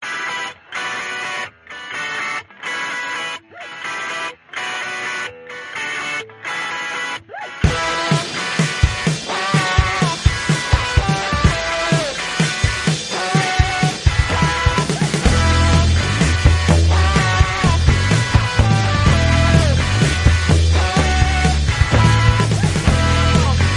outro music

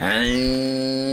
huhhhhhhhh

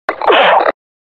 hit; combine

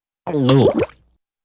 gulp with bubble

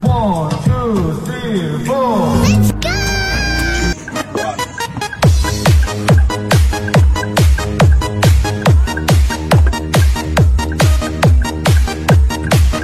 viral beats remix

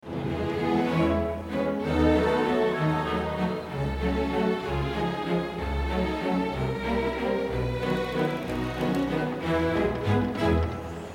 background; lego batman

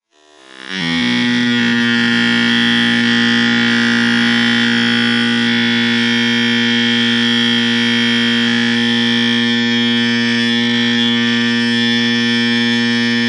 buzzer but very slow

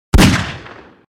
357 magnum; half-life 2

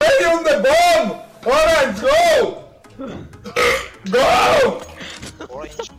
belched

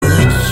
ding dong

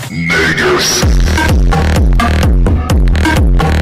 loud

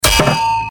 metal bat bang with slight reverb

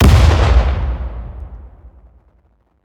c4 sound; hurtworld

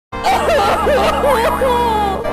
crying; arthur ruins everything

